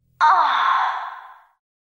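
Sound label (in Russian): zvuki zhenskikh stonov, female moaning sounds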